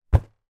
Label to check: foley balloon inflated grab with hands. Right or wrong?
Right